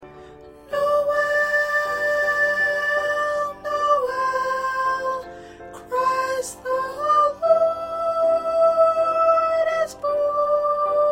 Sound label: 1st Soprano